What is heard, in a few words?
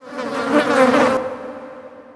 decay transfer; undying